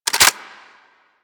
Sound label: Seer Clip In, Weapons; Warframe